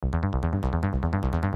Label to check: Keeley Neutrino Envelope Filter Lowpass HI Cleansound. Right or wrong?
Wrong